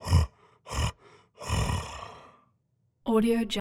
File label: giant breathing loop breathe then stop; human sounds